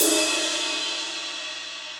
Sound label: Ride Edge Ambient Loud Punch; Drums; Drum Hits; Standard Hits; Ableton; Session Drums Studio